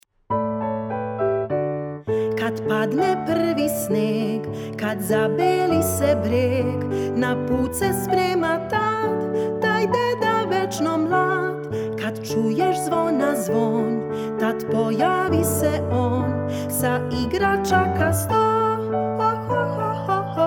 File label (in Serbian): Zvoncici zvoncici vokal instrumental; Музичке приче